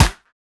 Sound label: snare one shot big thud; Snares